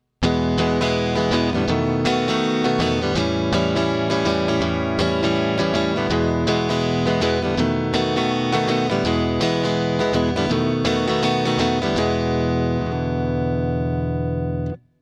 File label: blues prog